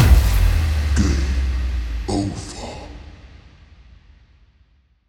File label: game-over-sfx-and-voice; BullShot